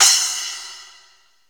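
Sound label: SPLASH CYMBAL; Greytsounds; JAZZ DRUMS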